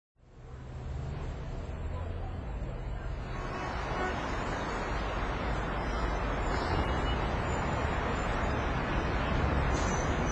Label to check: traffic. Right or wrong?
Right